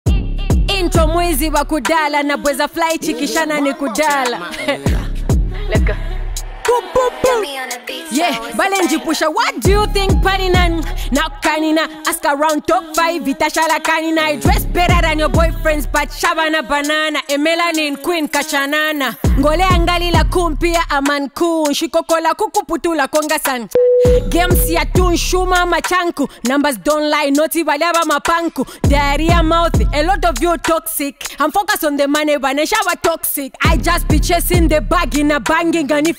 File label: Riddim Zambian Hip Hop